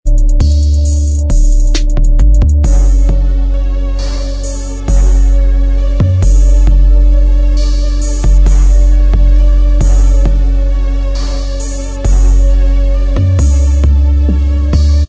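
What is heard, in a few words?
Instrumental Track, backing tracks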